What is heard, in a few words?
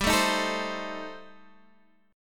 Eb 6th Flat 5th G, guitar